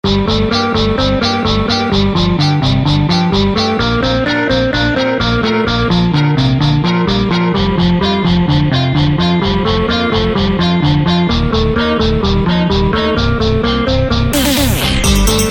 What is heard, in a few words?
guitar bass